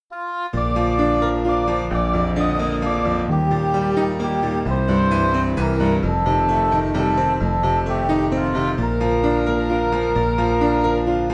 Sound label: Midi; His Grace Aboundeth; Kirkpatrick; Refrain